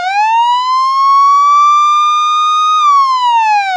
siren, amb

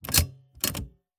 lever; Ammo

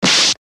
hit

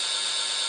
cam, CCTV

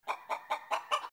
chicken, Farm

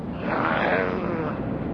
groan; zombie

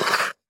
blade, iceskate

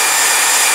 whine, vehicles

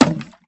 dice add

